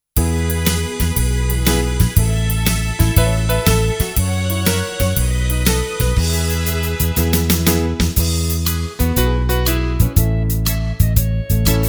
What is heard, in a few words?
click go shears K=E; AUSTRALIAN